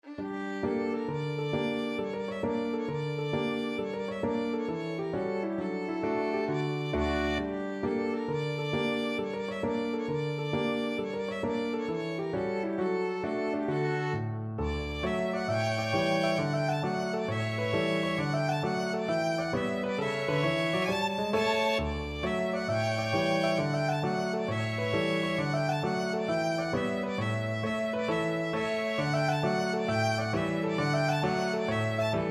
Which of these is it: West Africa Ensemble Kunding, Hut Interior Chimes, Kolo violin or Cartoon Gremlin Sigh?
Kolo violin